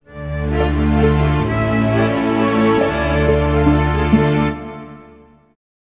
Closing (Stinger); medievil game rip

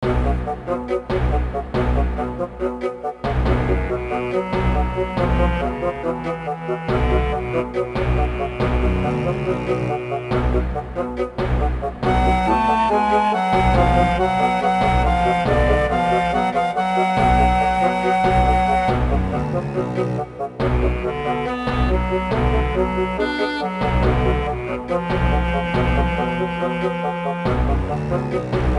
Background Music; little big adventure 2 twinsen s odyssey original soundtrack

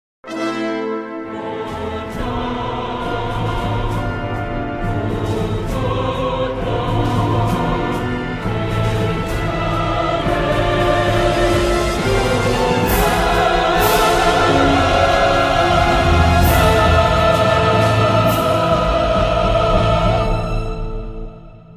Death; Star Trek Klingon Academy; windows

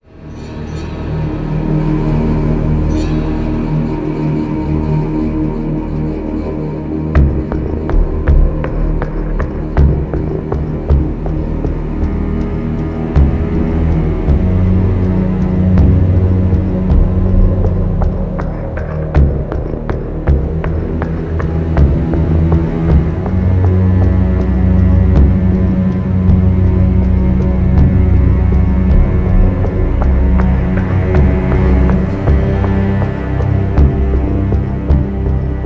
suspense; Call of Duty Modern Warfare Remastered

